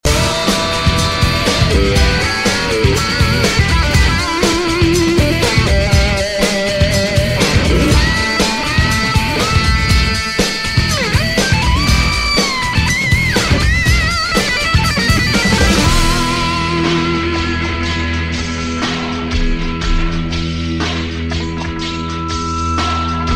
hot guitar solo; christian

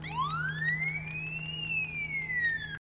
Tone Alert

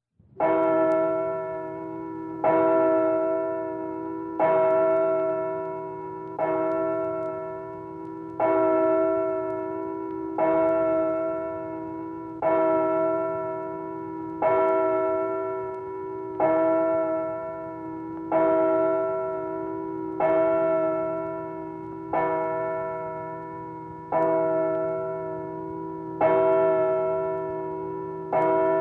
Clock Tower Bells

